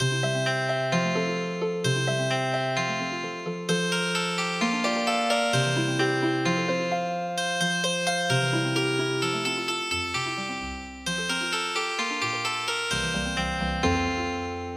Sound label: Healing Harp; Hot Cross Buns